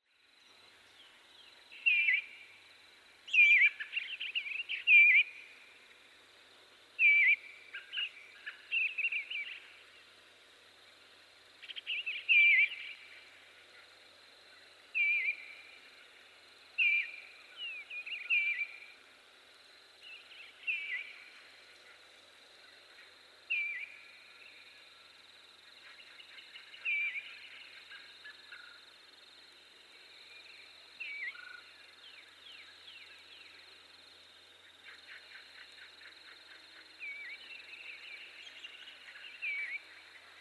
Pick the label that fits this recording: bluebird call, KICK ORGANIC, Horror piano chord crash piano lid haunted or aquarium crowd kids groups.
bluebird call